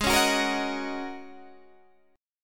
Ab 7th Suspended 2nd Suspended 4th down Guitar Open D